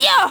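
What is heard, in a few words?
f oof, female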